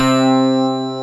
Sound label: SYN BELL; SoundFactory